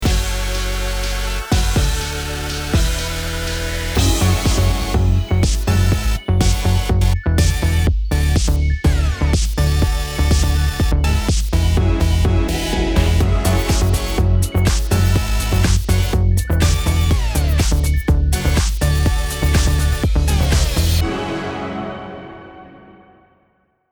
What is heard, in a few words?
Jingle long; IDENTITÉ SONORE; NOUJOUM EL BELDI